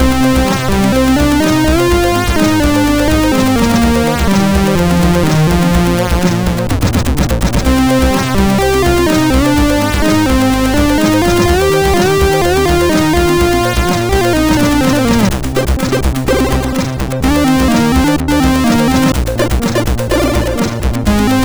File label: Partycode, MOS6581R2